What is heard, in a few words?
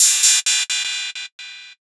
Gated Cymbal; musicradar